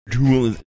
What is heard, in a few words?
making distorted audio sounds